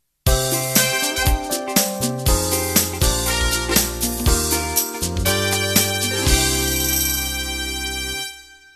Smooth Jazz End

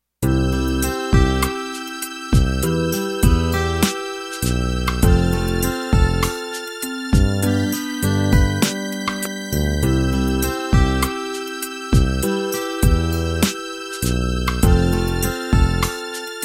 16 beat ballad; 16 Beat Ballad 3 X & 16 Beat Ballad 3 Y compatible MIDI file Intros and Endings

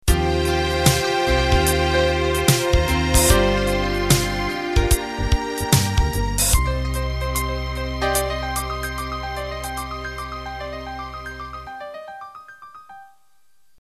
Swing Rock End

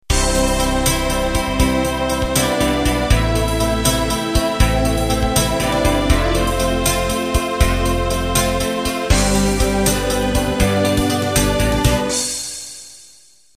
Slow Rock Intro

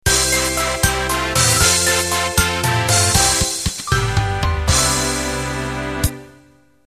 12-8 Pop End

